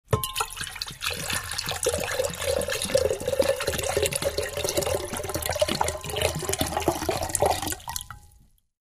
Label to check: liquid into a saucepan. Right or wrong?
Right